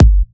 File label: Bassdrums; Vengeance Samples